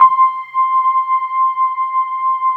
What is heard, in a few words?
FENDER C5; USB Soundscan vol.09; AKAI; Keyboards Old School